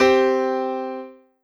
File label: 5th